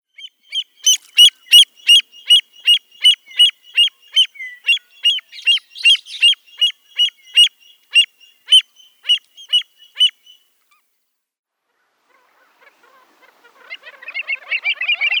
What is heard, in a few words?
American Avocet